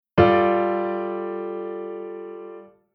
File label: Chord Compound